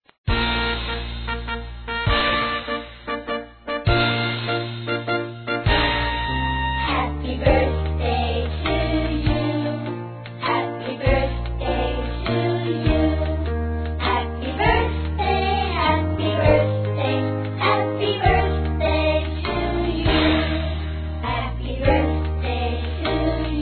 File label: birthday kids